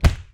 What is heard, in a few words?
skitter hit